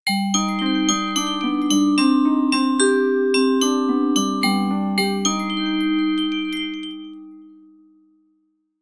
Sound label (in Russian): Театральный звонок